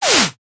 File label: whip whoosh